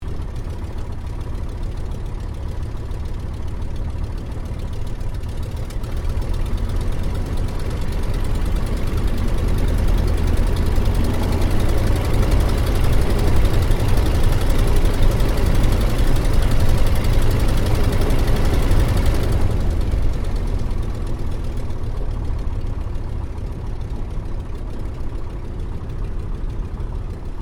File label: AIRCRAFT RYAN PT22 TAXI INTERIOR; 1942; Airplane Sound Effects; Airplanes (Sound Effects:Vehicles)